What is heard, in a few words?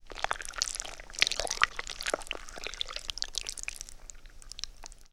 oozing glop; Sound Design - Horror; Sony Pictures Sound Effects Series Volumes 1-10; Sony Creative Software